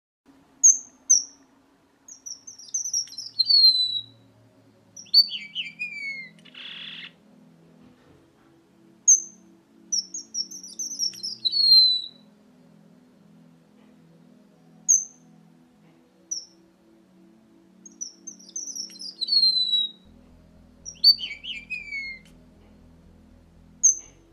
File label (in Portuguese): Bengali vermelho